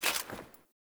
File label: Foley Action Armour Walk; Characters; Player; Deora Armour